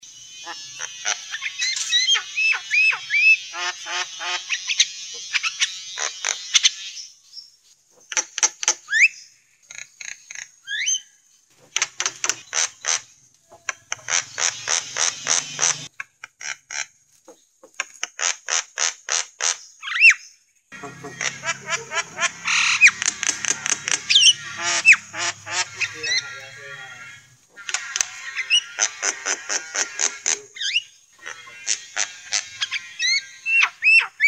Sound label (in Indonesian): suara jalak kerbau